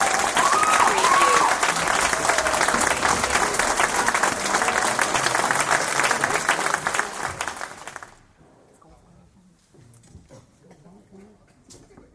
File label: Audience Applause; beanstalk audio